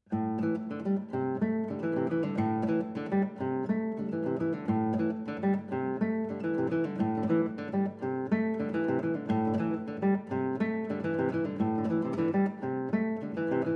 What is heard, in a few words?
Nagoya Guitars